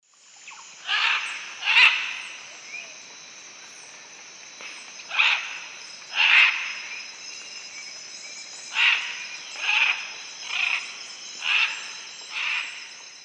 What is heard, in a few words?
Ara macao, flight calls; S. America; Eastern Lowlands